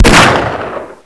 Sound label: 357 shot, weapons